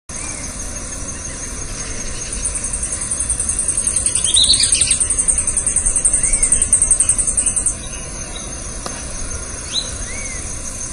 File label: Phaeomyias murina; Los Picazos; Jorge La Grotteria